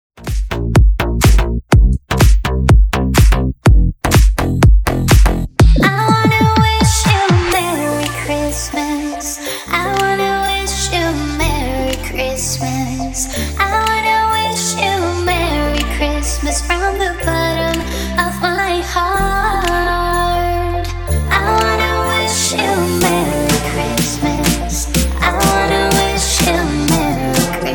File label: Electro Redrum